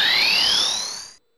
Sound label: teleport